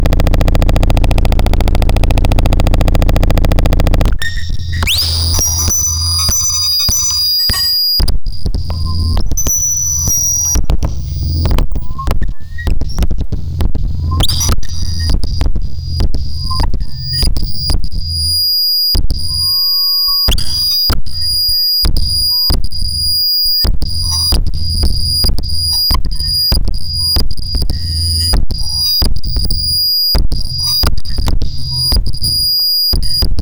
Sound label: feedback